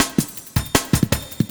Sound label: LOOP SD, 160 BPM